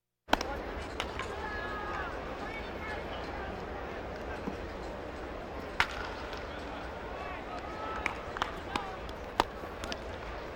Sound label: Crowds Race cars Baseball; Valentino Production Sound Effects Library